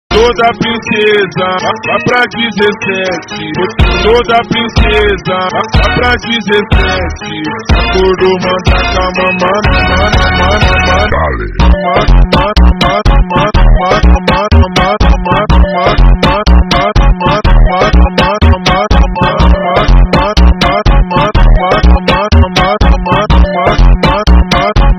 beeper funk

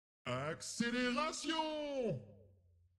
accelleration

